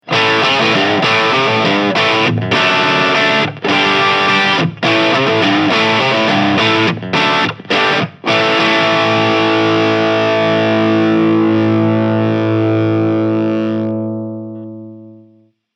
Cranberry Overdrive JCM 800 Low Input Heavy Crunch; One Control